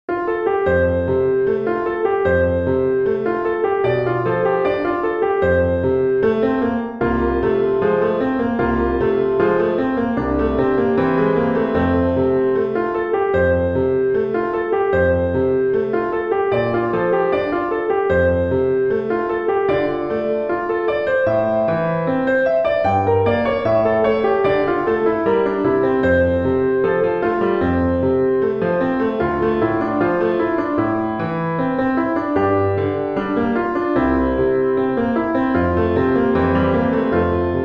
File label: Lovliest of Trees no Vocal